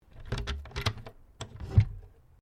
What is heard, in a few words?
Door Pivot Wooden Bookcase Open Key Lock; Various doors; Miscellaneous Doors